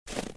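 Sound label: step snow; step on snow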